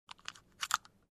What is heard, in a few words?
Aircraft bin lock click sound